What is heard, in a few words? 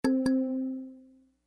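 message notification sound; Звук уведомления о сообщении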